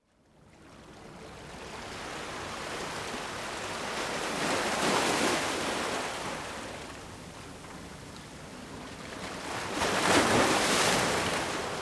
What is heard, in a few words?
small waves harbor rocks